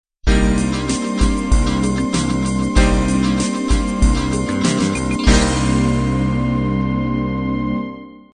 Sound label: 16 beat analog end